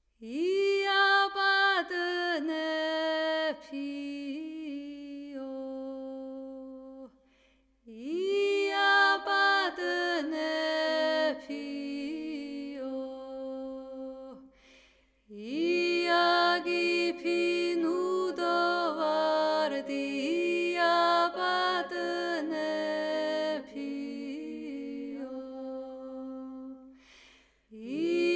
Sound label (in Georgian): ია პატონეფი მეგრული პირველი ხმა; გალობის უნივერსიტეტი; სასწავლო